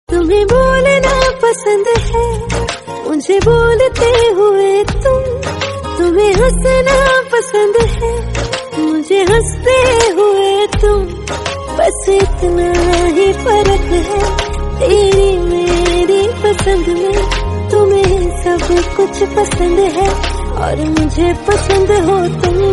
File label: love song tone ringtone, bollywood ringtones